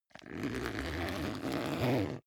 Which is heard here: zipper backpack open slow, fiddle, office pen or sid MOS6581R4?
zipper backpack open slow